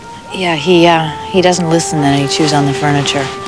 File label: chews